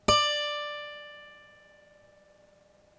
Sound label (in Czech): kytara; KMITÁNÍ A VLNĚNÍ; hudebni nastroje; CHORDOFONY